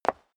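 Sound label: step high heels concrete single stair up, footsteps; schritte; menschen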